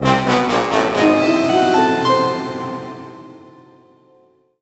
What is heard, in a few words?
Music Stinger Fail; Monster Lab; NDS